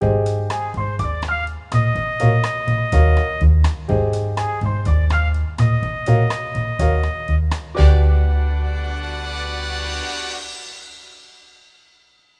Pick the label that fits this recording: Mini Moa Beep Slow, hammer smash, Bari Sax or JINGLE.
Bari Sax